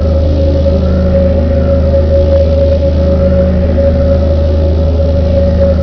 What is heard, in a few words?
gate hum; mod quoth; necros